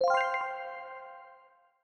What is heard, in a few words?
success sound effect